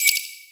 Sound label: Hover; Feel; NiceVibrations; Haptic Samples; ApplicationUX